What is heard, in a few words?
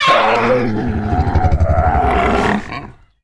ronto dead; Star Wars Knights Of The Old Republic I; XBOX